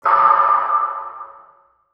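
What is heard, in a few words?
water drop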